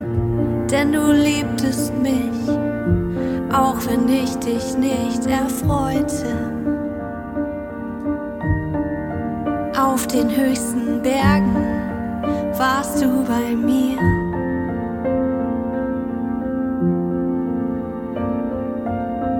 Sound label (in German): Piano Version